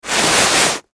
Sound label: Snowboard Skid, Snowboard Skid Brake Stop Board; Skiing And Snowboarding; Sports; SoundEffects-Blastwave